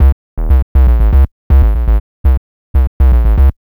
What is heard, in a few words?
Synth Bass